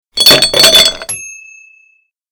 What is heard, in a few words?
Ice In Glass